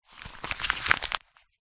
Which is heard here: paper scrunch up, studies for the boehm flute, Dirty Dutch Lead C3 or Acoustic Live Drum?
paper scrunch up